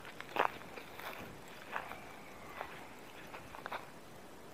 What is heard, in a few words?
walking then soft Breathing; Pere Cheney; EVP; Pere Cheney July 20 2017